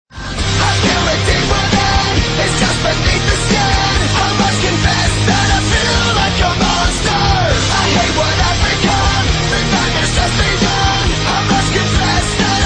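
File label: iphone upcoming ringtone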